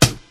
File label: short rap steel snare drum c key